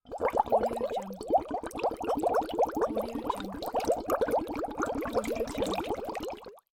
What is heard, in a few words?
lab atmosphere